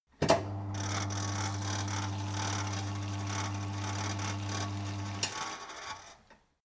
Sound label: mechanic sink water running long